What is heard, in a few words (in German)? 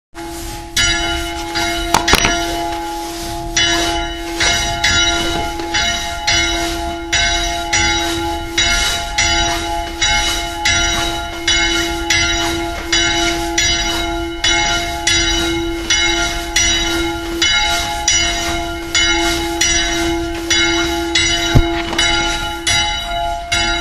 Gelaeut; Hornhausen